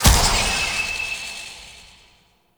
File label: Decode Destroyed; Warframe; Emissary Season Two